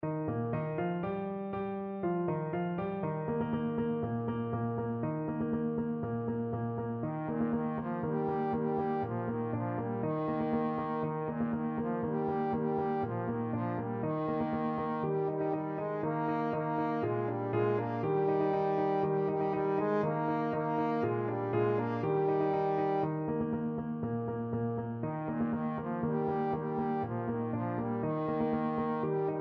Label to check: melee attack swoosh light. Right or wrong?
Wrong